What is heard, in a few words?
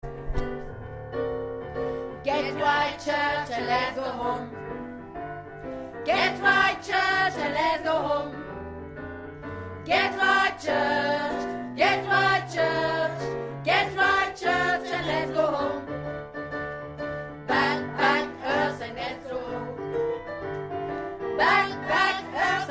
ALTOS